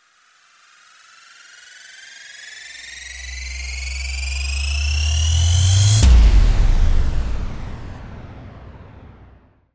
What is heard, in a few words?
RISING IMPACT